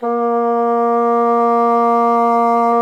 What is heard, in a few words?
bassoon; Miroslav Vitous; Solo Instruments VOL-1